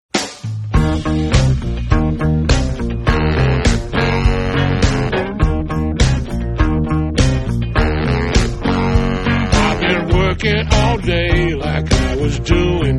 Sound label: jungle